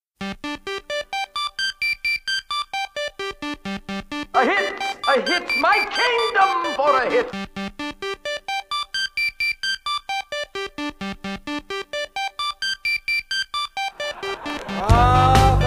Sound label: keyboard